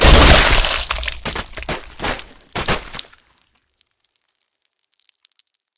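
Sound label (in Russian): взрыв с осколочным ранением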